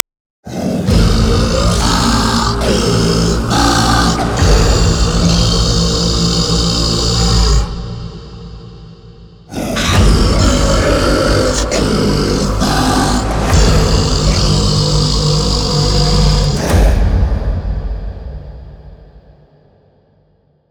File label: Quest Intro Chant; Entrati Labs